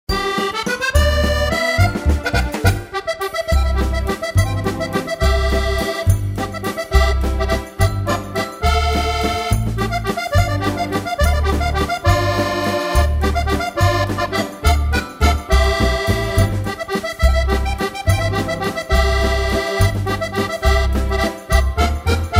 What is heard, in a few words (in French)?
tyrolienne